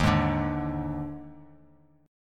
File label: D sharp Major 7th Suspended 4th, D sharp, down; strummed; D#M7sus4; JGuitar